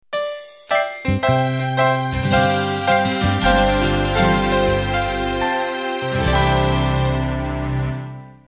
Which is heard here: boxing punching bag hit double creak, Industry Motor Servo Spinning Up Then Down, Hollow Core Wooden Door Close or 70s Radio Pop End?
70s Radio Pop End